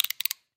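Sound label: hair clipper sounds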